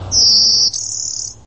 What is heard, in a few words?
Vermivora cyanoptera; Chordata; LandBirds